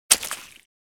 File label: Single footstep wet muddy puddle